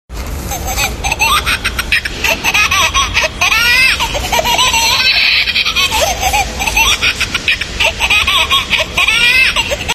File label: laughter